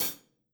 Hihat Session Dry Stick Tip Hard

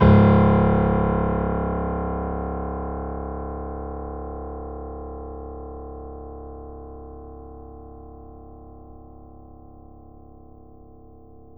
Grand Piano C#0 f

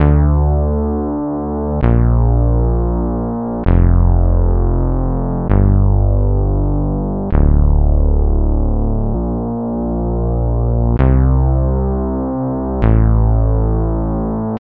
Omni Bass

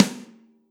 Snare Ambient Loud Punch Stick Hit Hard

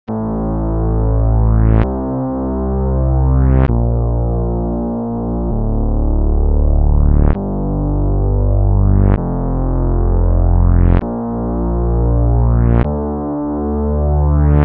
Omni Bass; Reverse

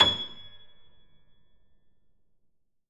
Grand Piano G#6 mf

